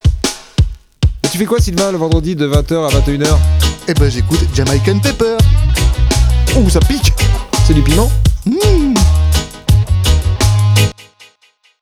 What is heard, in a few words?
jingle